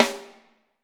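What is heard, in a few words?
snare drum, Drums; Bob Clearmountain Drums I; SD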